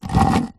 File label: brick small move scrape on dirty concrete; foley; zapsplat